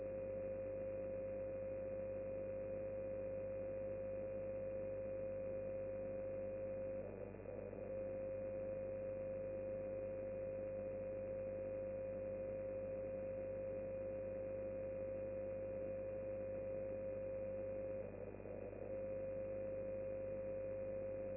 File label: dcf